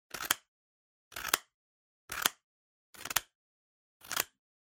Scissors Cut Paper

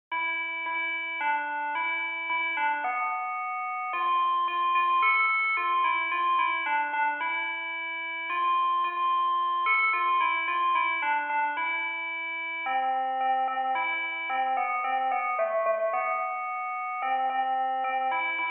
Crosswalk Signal

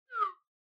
Smartphone UI Sound Speaker

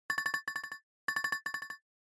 Smartphone Ringtone Dry

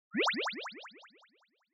Anime Motion Delay

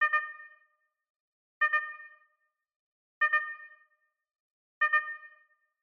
Crosswalk Signal Loop

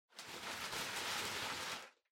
Packing Paper Far Rustle; 梱包紙; 遠い ガサガサ; 梱包材

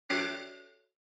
OS Sound (Speaker-Notification)

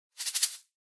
Shaker Roll Short

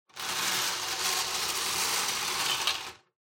Bingo Machine, Spin Drop

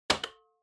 Flip Clock Button On Strong

